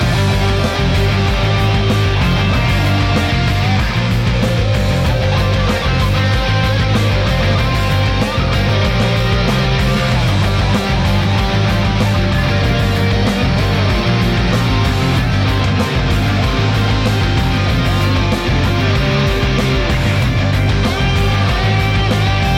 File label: Tense; Bleach Brave Souls